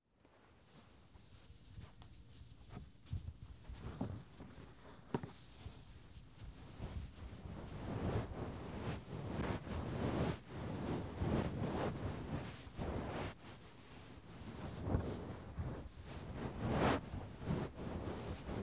布団もぐり; 音フェチさんのための効果音抜粋 vol.1

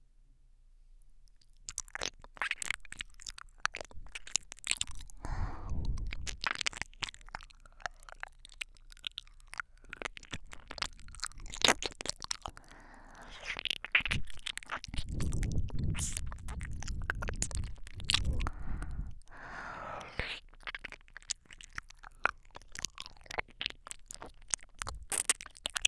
ゆっくり耳舐め

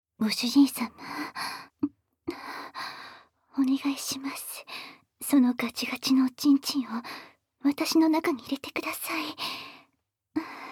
立ちバック・高速ピストン♪; ご奉仕メイドのあまあま子作りエッチ ～ご主人さま、気持ちいいことして癒やして差し上げますね♪～

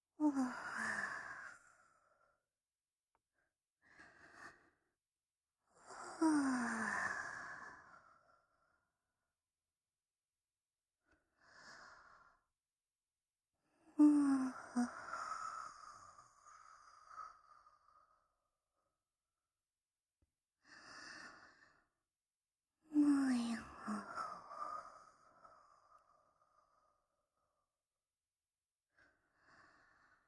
寝息; 妹２の寝息

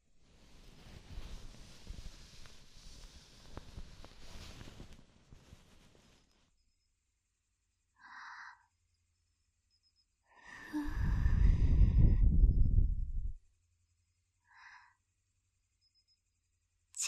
耳かき, ASMR; 内緒の刻

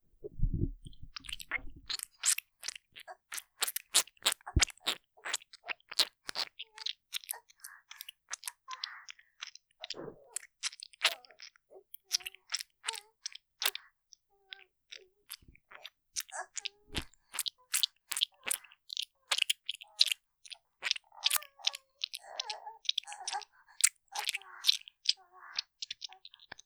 効果音◆おまんこ・ぐちゅぐちゅ, ASMR; 音量増量版; モノラル; ループで楽しむ！シェフの気まぐれ抜き抜きコース

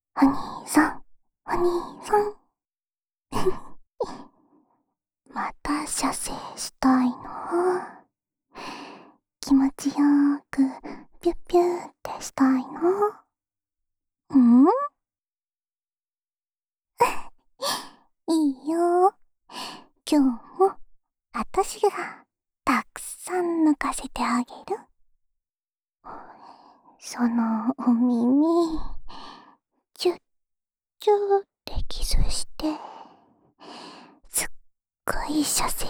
オナサポ用耳舐めループ（左耳）, ASMR

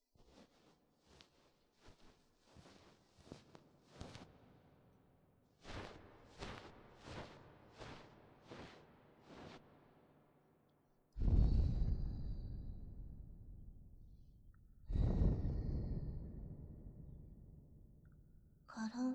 癒しのタッピング

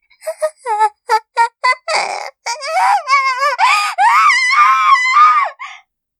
叫びだけ; おまけ(暴行)

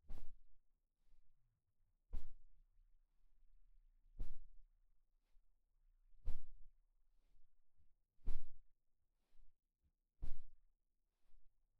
布団 を トントン 叩く; KU100; 布団の音

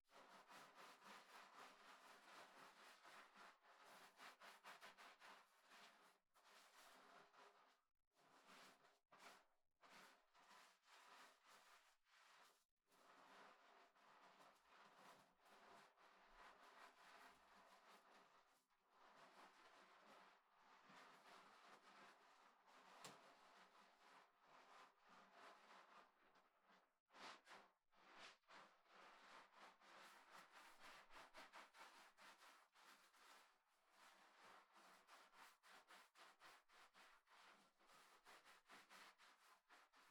相手 体 を 洗う, お風呂に関する音; KU100

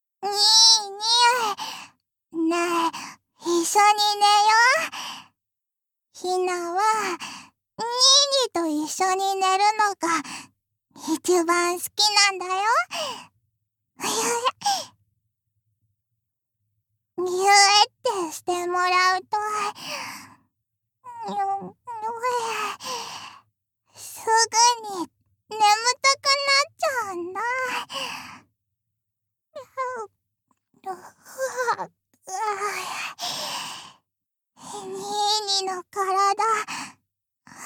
キスボイス; 三女

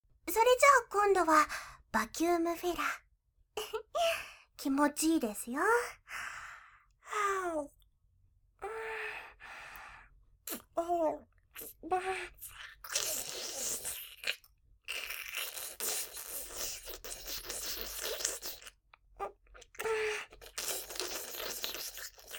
バキューム; ダミーヘッドバイノーラル; VOICE LOVER

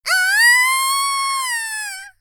少女 絶頂「あーーー！」; 元気な少女

